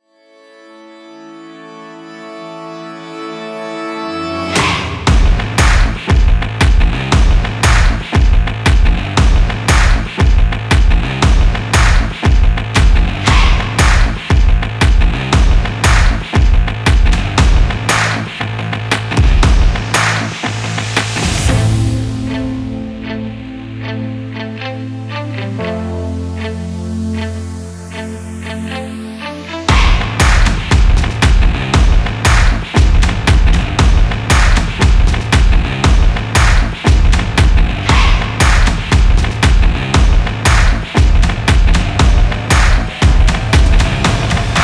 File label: stomping extreme sport